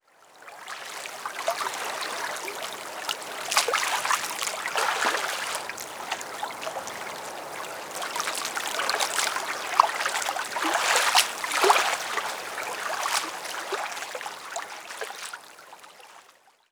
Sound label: environment water quiet docks